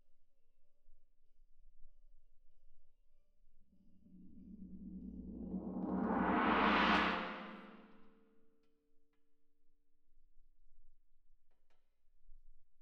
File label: Tam-Tam